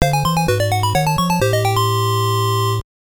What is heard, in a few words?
Jingle Important Item